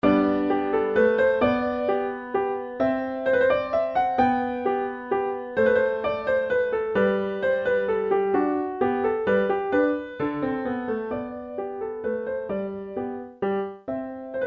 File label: Bach Menuet Sol Major